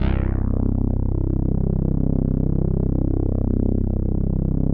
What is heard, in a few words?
505, String Machines